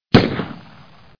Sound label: 44 magnum